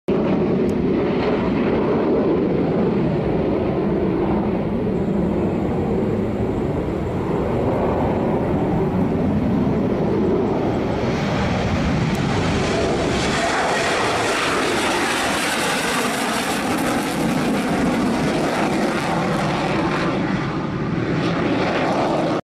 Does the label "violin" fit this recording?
No